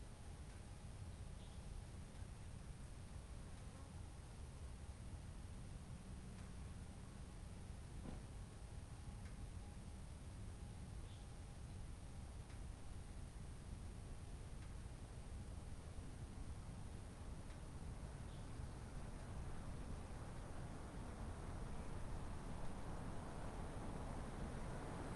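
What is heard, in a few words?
exterior day road quiet residential street car bys slow speed light bird chirping; ambix; sonniss gdc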